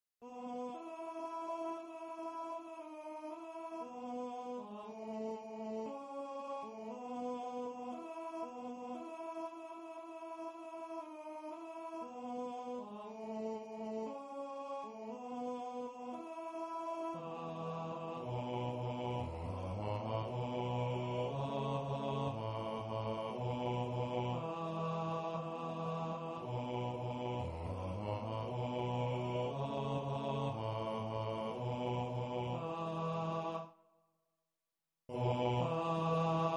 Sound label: The Boar's Head Bass, ttbb